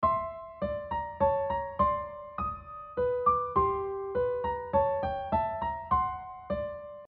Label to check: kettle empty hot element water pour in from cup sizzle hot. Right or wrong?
Wrong